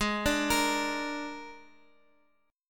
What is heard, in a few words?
G sharp Suspended 2nd Flat 5th, Guitar; G#sus2b5; arpeggiated; JGuitar